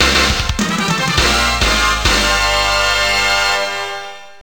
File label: Bonus Game Clear Perfect; Gals Panic 3; arcade